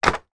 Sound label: game card pack place in box tray; monopoly